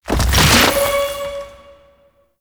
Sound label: protective shell charge; Citrine